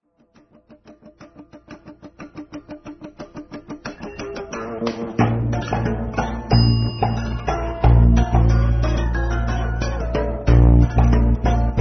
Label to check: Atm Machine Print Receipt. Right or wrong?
Wrong